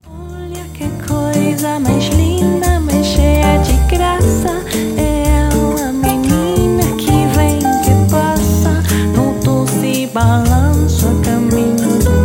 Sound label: Vocal